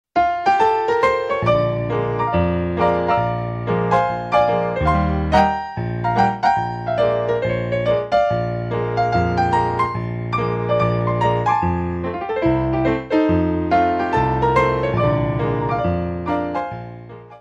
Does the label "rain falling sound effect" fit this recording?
No